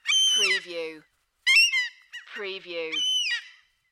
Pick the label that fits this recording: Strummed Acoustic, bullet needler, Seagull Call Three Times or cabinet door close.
Seagull Call Three Times